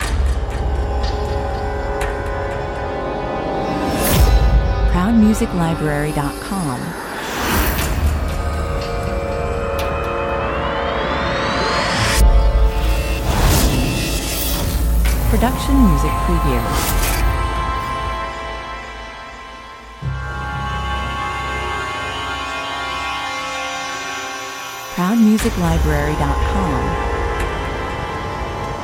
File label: dark future opener 120 bpm